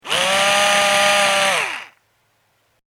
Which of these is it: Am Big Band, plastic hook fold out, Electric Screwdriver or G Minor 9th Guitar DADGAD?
Electric Screwdriver